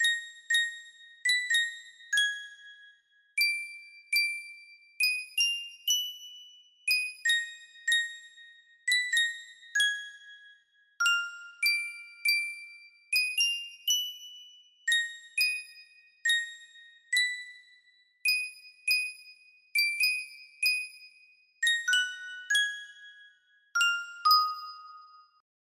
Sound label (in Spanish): caja musica